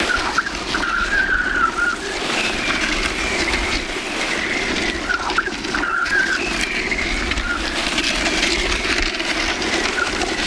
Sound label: skid earth